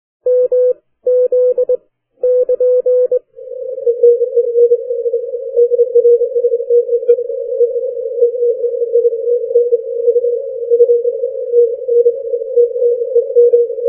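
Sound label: 40m short path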